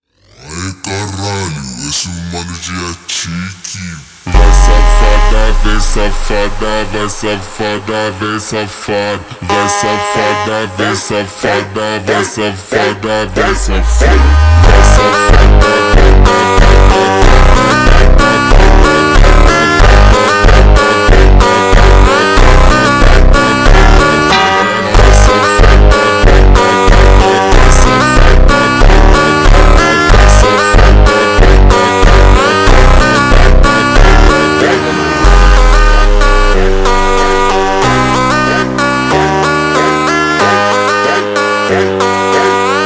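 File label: ultra Slowed, phonk music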